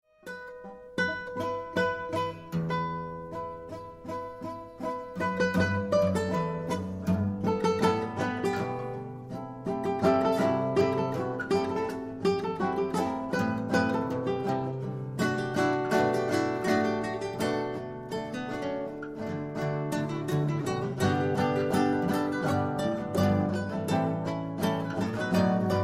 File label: Trio Impro